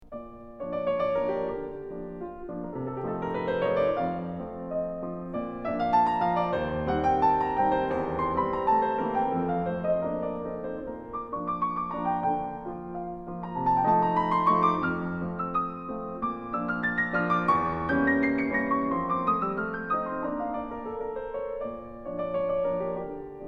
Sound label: Schubert Impromptu B-flat